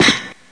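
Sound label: gr step, footstep metal grate; SINDEMO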